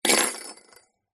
bells sleigh bells down on table

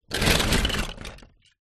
leisure toy cars in cardboard box movement

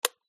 light power switch socket

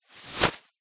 foley plastic bag very thin item drop in; zapsplat

